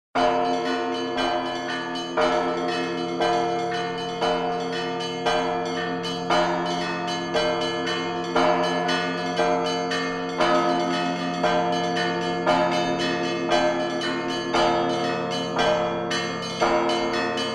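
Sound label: bell tower ringing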